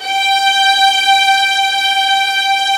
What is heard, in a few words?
STRS G4